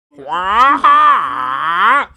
Yell Short

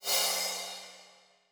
grow

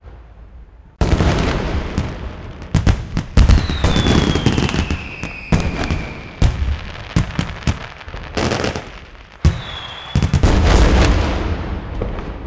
Ambi Athletics Crowd

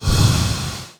Teleport

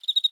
Env Insect Sing

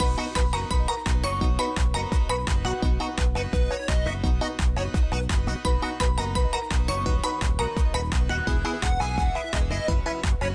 Maid Lobby; Speaki

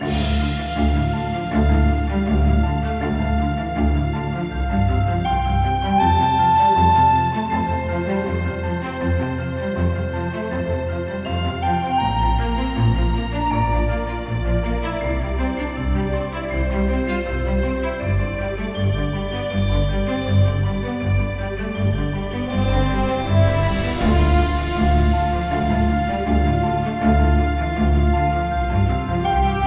Music BGM; wenjia; ios switch windows xbox one

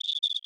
Env Void Cicada 3 Singles

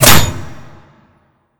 Hit

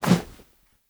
Self Stab Prepare

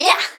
Rogue Attack; Pecheneg

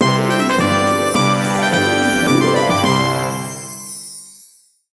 Final Gem Sting

